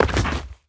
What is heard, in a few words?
Bodyfall Dirt; SFX Kit; Bodyfalls